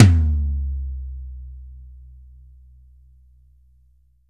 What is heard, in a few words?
Tom Mid Gretsch, Drums